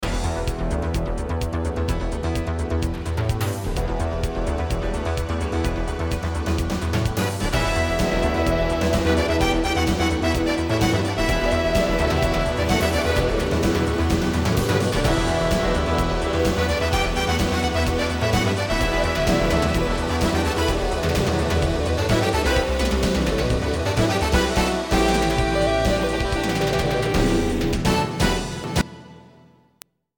片头音乐

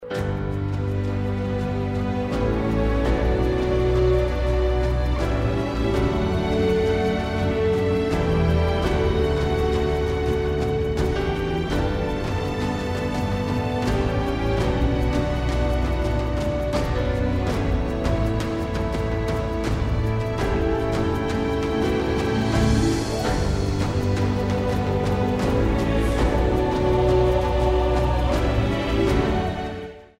专题片背景音乐

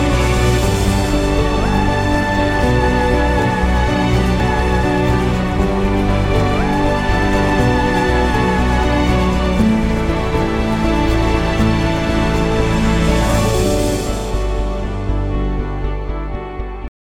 大气的背景音乐

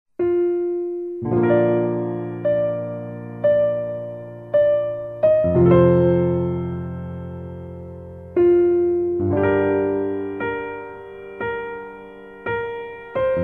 微电影背景音乐

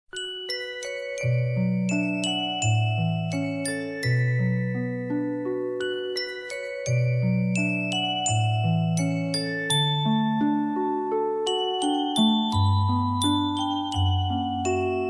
轻柔的背景音乐

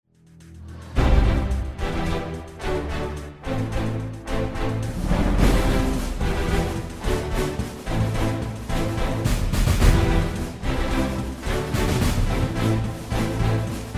专题片背景音乐

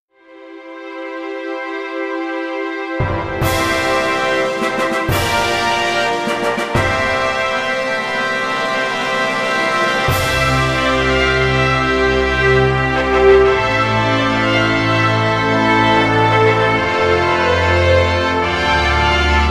豪迈的背景音乐

